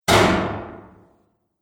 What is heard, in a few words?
Exploding Bang, bomb sound effect; Bomb Sound Effects; Explosion; Bombastic Booms And Battle Ambience